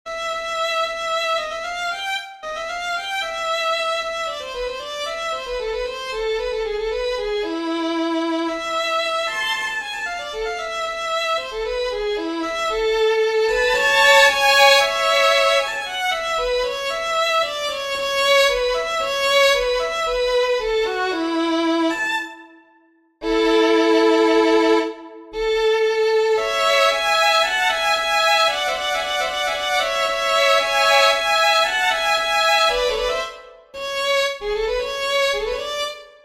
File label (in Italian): Violino